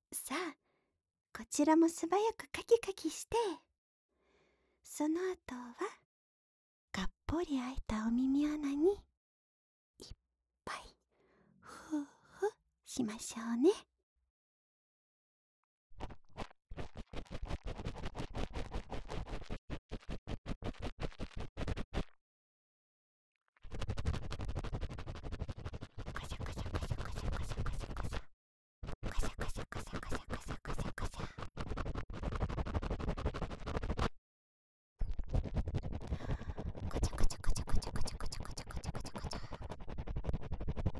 【左】浅め高速耳かき, ASMR

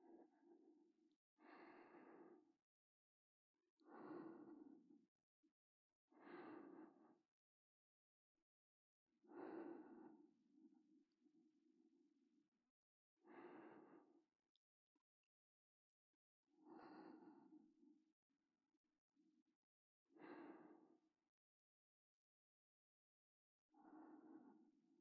寝息ループ; ルリの寝息ループ

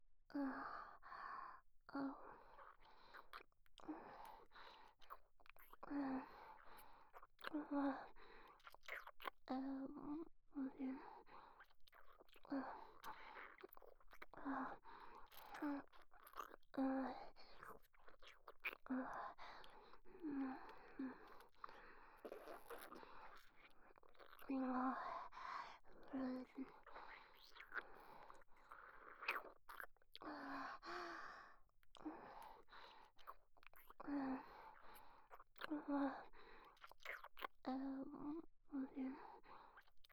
ラブラブ ベロチュー ループ; ラブラブえっちボイス

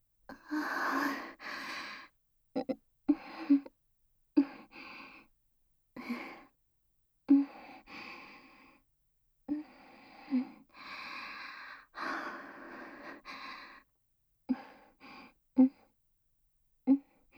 オイルマッサージ素股, ASMR; オイルマッサージ素股

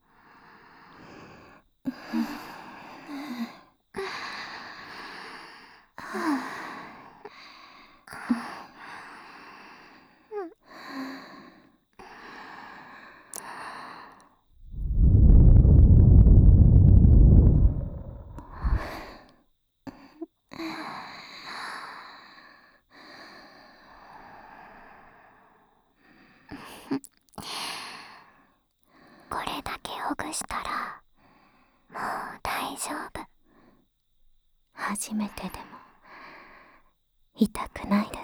耳奥W舐め; ハジメテの耳奥W舐め犯し

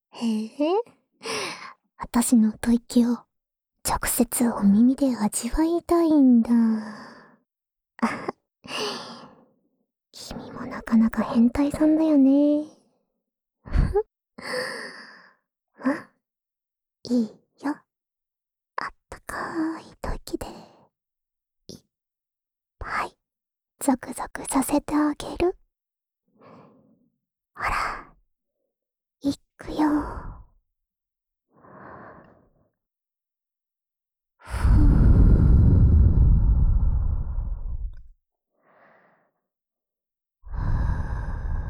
安眠用お耳ふーふーループ（右耳）, ASMR; 兎衣の安眠用お耳ふーふーループ（右耳）

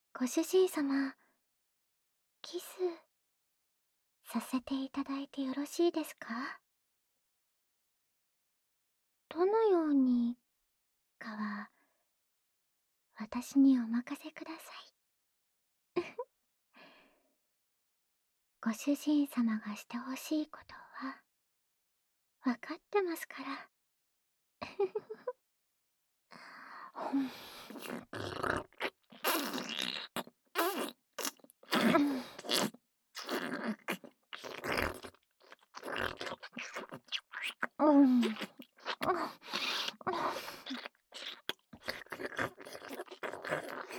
キス ハード; メイドdeフェラチオ

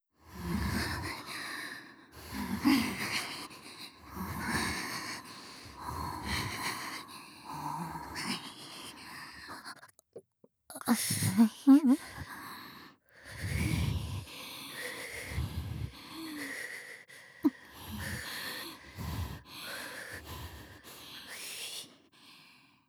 W吐息, ASMR

